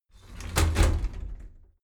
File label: shower slide door inside close regular halfway